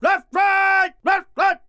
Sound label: Enemy Generic Human Spawn Army